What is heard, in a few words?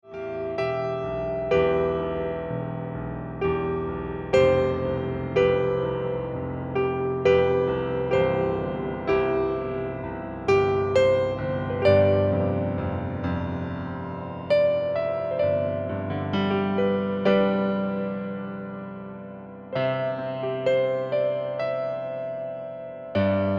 Ringtone Piano Music